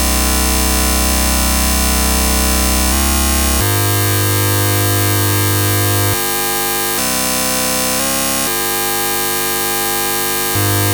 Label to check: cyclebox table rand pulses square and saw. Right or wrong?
Right